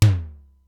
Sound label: Simmons SDS-9, drum machine; Factory C1